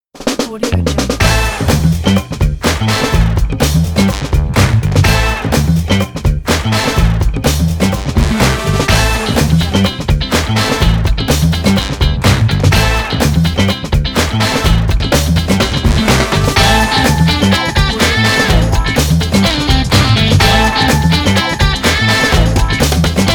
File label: upbeat funky intro logo; puremusic